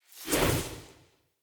Staff Caster Cast Long; Weapons; Staves; Starter Staff Caster

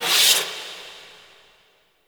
Capsule Start; Ambience; Warframe; Clan Dojo

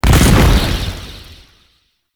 Wraith Leap Jump Land; Zariman

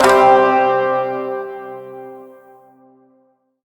Shawzin Chromatic Chord; Warframe

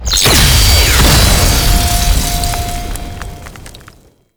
Prime Blast; World Seeder Descent

